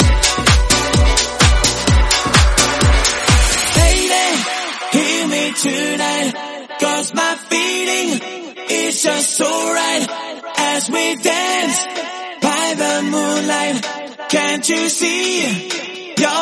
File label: 128bpm Bm